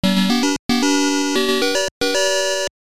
Act Start; Pengo; arcade

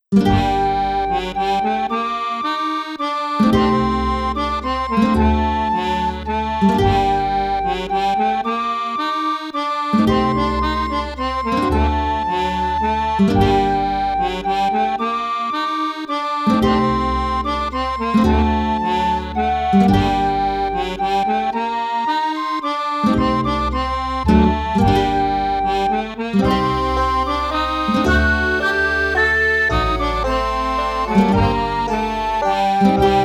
Tavern; Sea Dogs To Each His Own

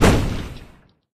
Sfx Explode; Friday the 13th: Killer Puzzle

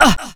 "Uhh"

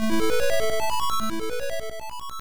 Treasure Chest; Legacy of the Wizard; NES

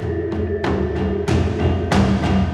Goblin Combat Transition; song of iron

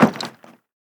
duffle bag drop on ground

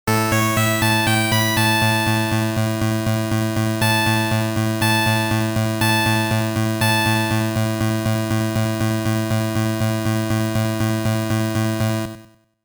Level Intro Level Clear; Image Fight; gamerip Famicom

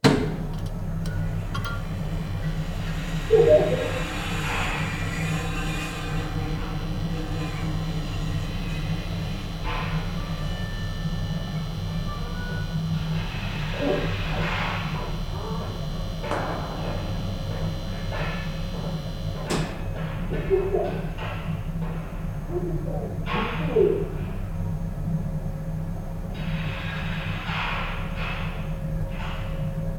Ambience Interior Prison

